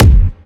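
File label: bass drum sound f sharp key, kick drum tuned to the F# note; kick drum samples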